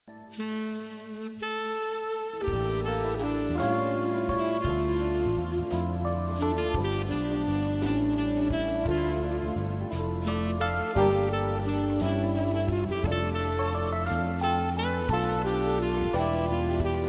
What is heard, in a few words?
melody jazz, ringtones